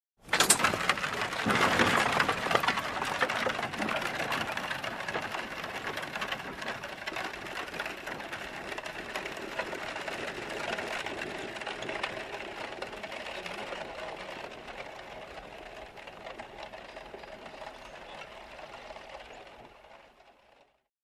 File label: Horse Carriage passing by